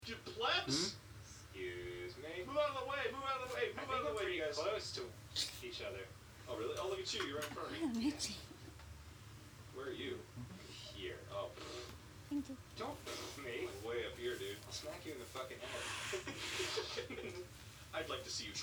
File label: Sniffle; My boyfriend and I watch Game Grumps. I sniffle and he hands me a tissue.